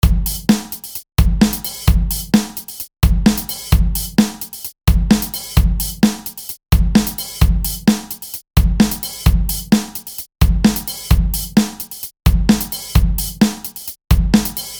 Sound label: Drums Dry